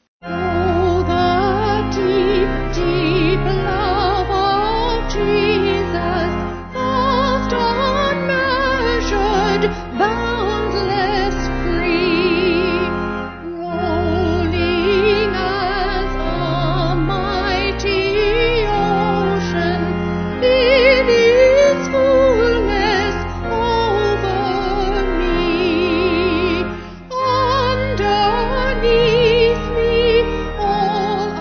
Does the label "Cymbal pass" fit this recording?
No